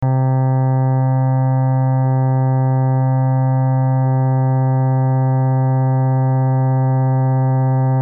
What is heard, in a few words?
cello